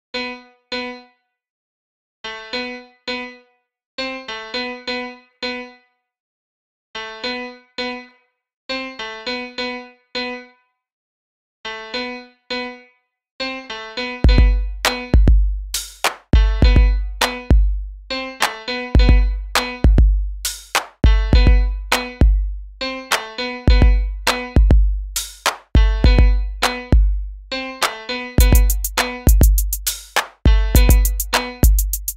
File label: Instrumental